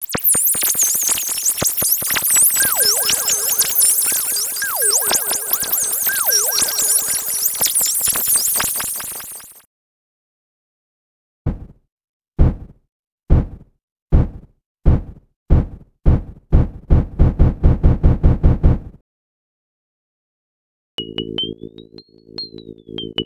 Space FX; X-Static Goldmine 5

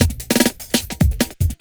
LOOP, Drum & Bass, 150 BPM

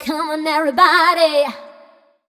f mel f, fem Vocals

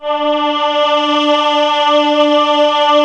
ELECHOIR; Rubber Chicken Software Sample Bank

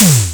SD Tom Hard, Simmons SDS5 drums

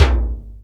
PITCH BEND T; Greytsounds; Scott Peers Sound Engineering

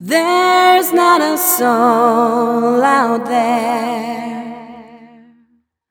f mel e flat, fem Vocals; Mutekki Media; Diamond Vocals 2

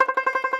Tenor Banjo, Plucked Strings

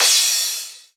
Crash, Cymbals

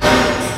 XENON FX; USB Soundscan vol.24; AKAI; Industrial Loops

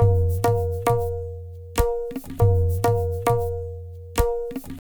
Tabla, 100 BPM; Zero-G; Pure Tabla

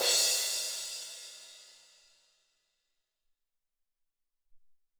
16 Crash, Alesis SR-18

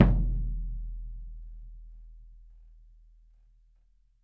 SURDO, Brazil Drum Perc; E-MU Producer Series Vol. 7 – Old World Instruments

